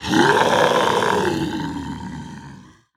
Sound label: Zombie Growl